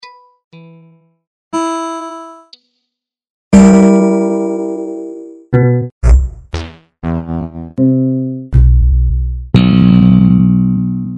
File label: Rock, click sound